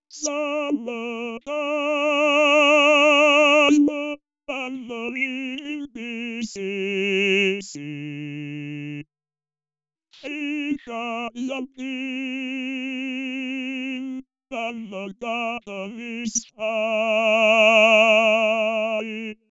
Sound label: baritone; KTH